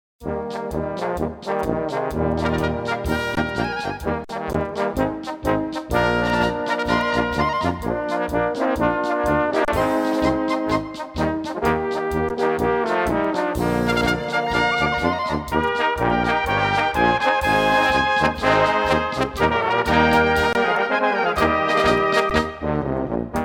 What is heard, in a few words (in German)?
Oster Polka